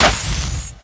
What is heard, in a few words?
Humanoid Melee Magical; Kilta